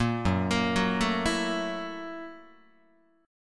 F sharp 7th Sharp 9th F sharp down Guitar; 1,2,1,4,1,3; 4A,4E,5A,5D,5Gsharp,6Csharp; arpeggiated; JGuitar

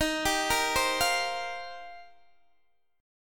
D sharp 6th Add 9th, Guitar, Open D

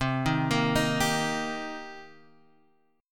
C Minor 7th, Guitar, Half Step Down

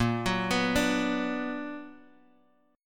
B-Minor Major 7th A sharp

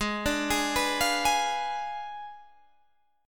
Ab Diminished 7th Ab Guitar Half Step Down

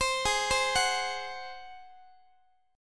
Ab 7th Ab down Guitar; x,x,15,18,16,17; arpeggiated

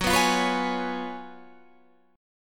F sharp Major 7th Suspended 2nd Suspended 4th

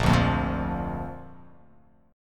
A sharp Major

